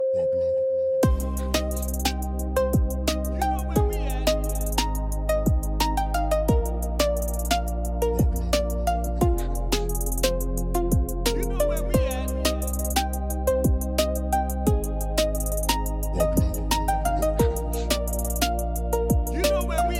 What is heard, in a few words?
Reggae Dancehall